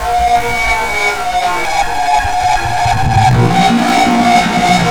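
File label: FX REVERSE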